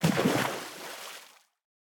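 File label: Splash Medium; boomerang fu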